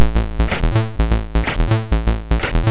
War Cars Construction Kit, MOS6581R3

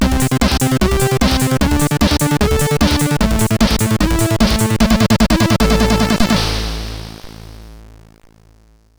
Trance, CSG8580R5; Trance Sector Ultimate

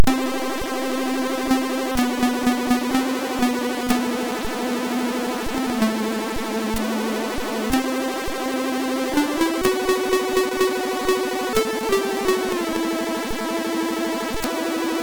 music, sid, MOS6581R3

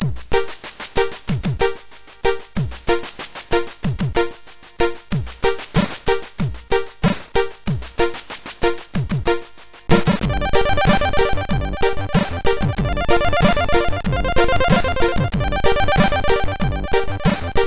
Reggae; Smilin Reggae

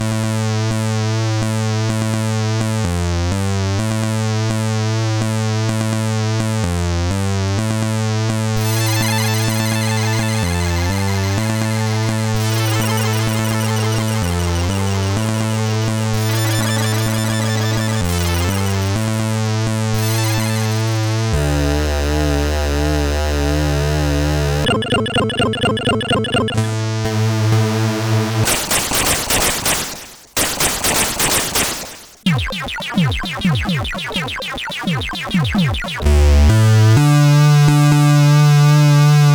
Kettle Intro, CSG8580R5

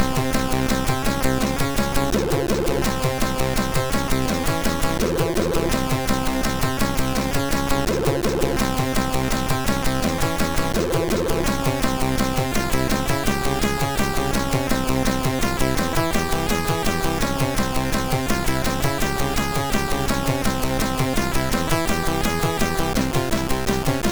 Rock 45 sid MOS6581R2

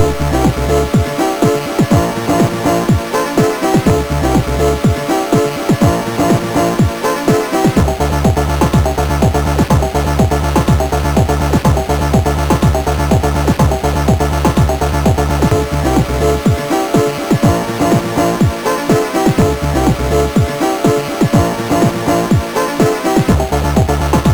MOS6581R4 sid; Amiga Mix

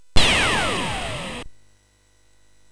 Final Synth Sample, MOS6581R3; hvsc

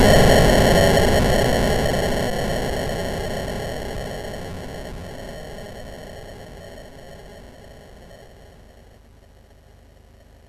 Frantic, MOS6581R4

